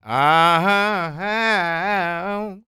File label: SING, Blues Soul